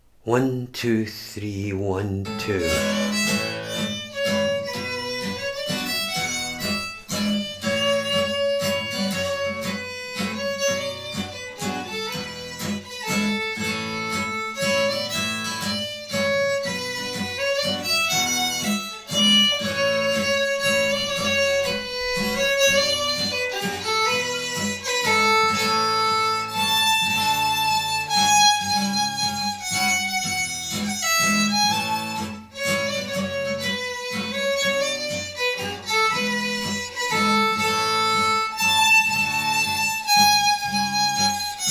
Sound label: Estonian Waltz; GFW Slow Session; Waltzes